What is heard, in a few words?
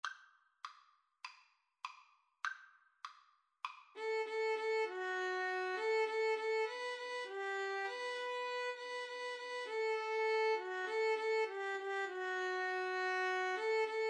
cello cello; how great thou art